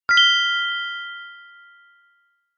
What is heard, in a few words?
fantasy magic chime ping wand fairy godmother